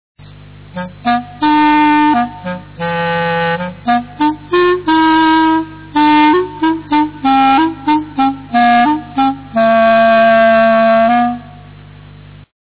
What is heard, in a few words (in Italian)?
clarine; inverno